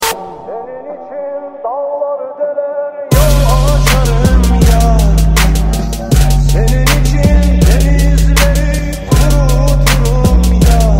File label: trap remix